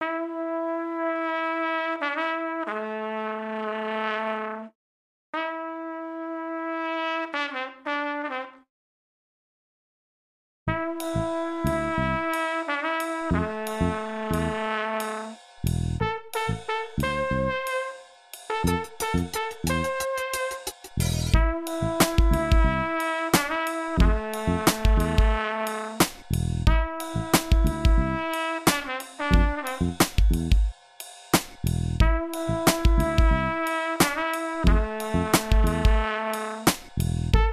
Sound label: jazzy trumpet; sarmacja